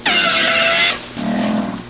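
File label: elephant, animals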